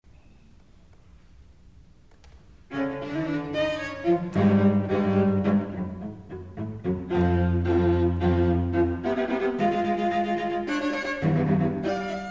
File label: Seven Etudes, string chamber; a due 1973